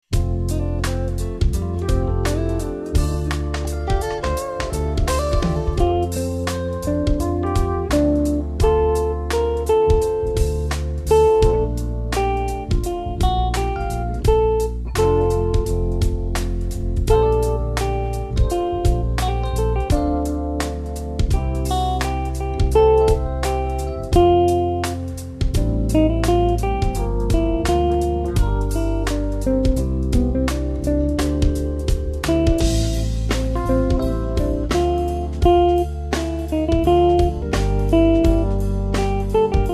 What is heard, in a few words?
Ave Maria Jazz